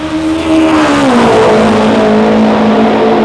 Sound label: cart car